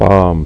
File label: Bombs; SchlonzUT2004